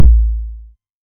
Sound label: 808 Kick; Novation DrumstationV1